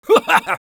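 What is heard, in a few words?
laugh short, Spanish; Sniper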